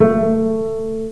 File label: A string pizz, cello